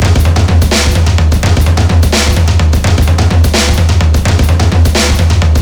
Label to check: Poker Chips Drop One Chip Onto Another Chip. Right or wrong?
Wrong